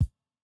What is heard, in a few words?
kick; Reference Beat BPM120; j city pop 1980